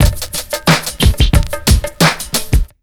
90 BEAT, Pure Electro; USB Soundscan vol.03; AKAI

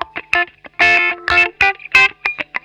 SHRILL WAH, Funk Guitar; Zero G - Funk Guitar

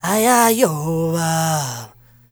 Zulu male, Heart of Africa, male dry; Spectrasonics; Heart of Africa 2; S.MALEDRY

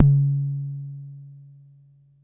Bassdrum; Jomox Xbase09

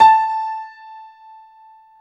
PIA MICRO, MICRO-PIANO, Roland

